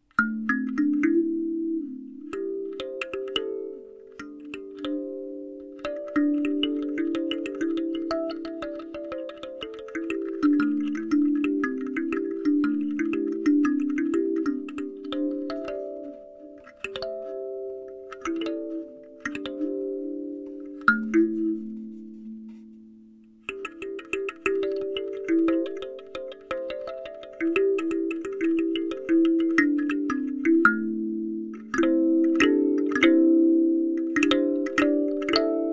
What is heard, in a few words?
Hokema Pentatonic, kalimba